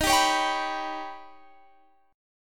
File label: D sharp Augmented 7th D sharp Guitar Standard; strummed; x,18,17,18,20,19; JGuitar